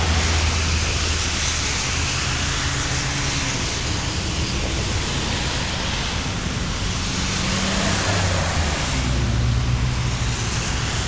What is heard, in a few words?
bkgnd music; Virtual Graceland